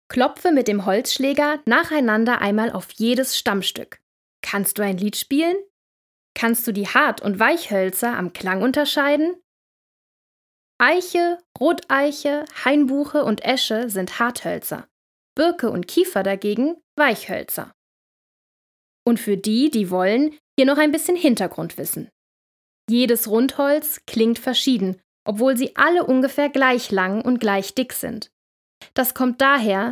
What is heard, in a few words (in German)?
WALDXYLOPHON